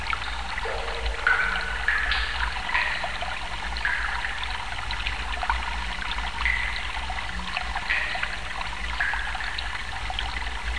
Cave Ambience

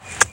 chip; Jack

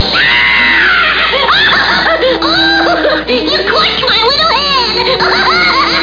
crushed

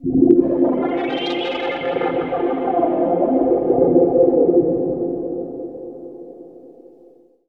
AMBNT 160; eJay; Ambient

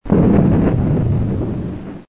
Explode; Arcade Lines

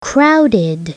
crowded; WordBank

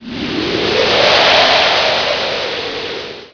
Wind

